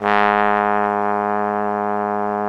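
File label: Bone Sec, trombone section; Roland L-CD702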